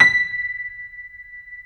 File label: JAZZ, Keyboards Acoustic; AKAI; USB Soundscan vol.17